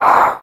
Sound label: hurt monster